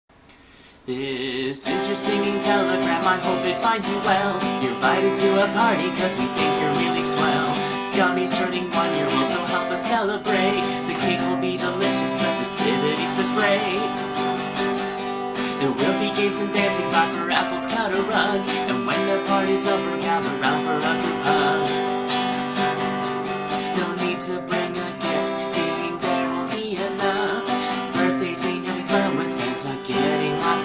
Guitar Cover

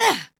Grunt; Lewd SFX

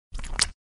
Suck; Lewd SFX

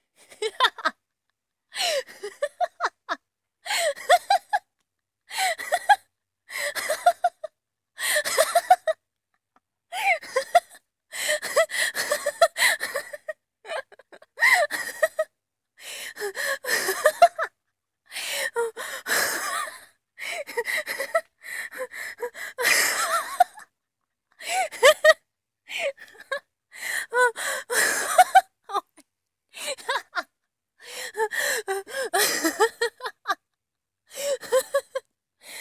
hahaha

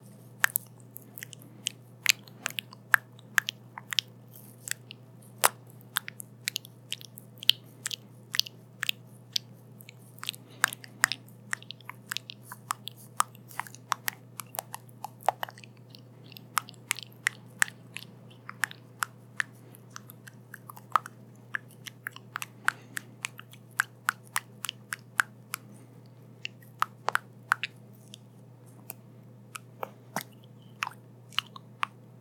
Wet and Squish! ASMR

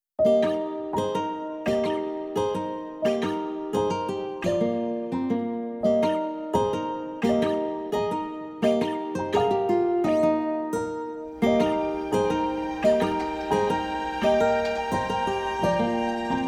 Fiddle; Ponytown

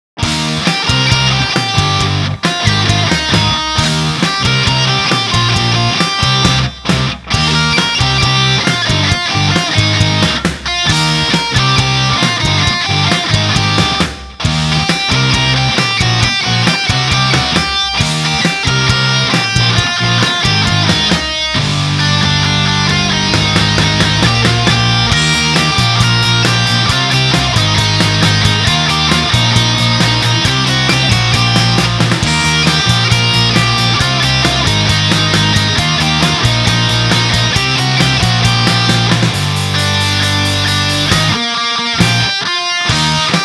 Instrumental Cover

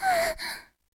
Moan

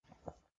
Swallow; Lewd SFX

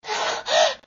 Pant

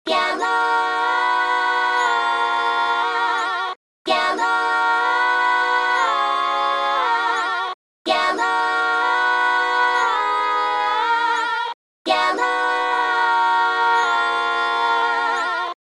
Choir 120Bpm; Pony Fan Music Remix Stems

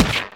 Smack; Lewd SFX

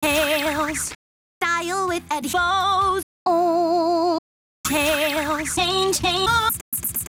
vocals, 130BPM; Pony Fan Music Remix Stems

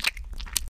slosh; Lewd SFX

